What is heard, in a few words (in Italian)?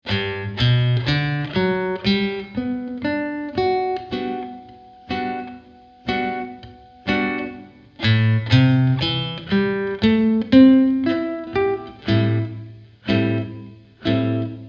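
Esercizio in Sol Maggiore, chitarra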